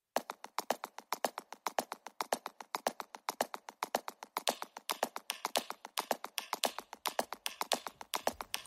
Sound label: Soft beat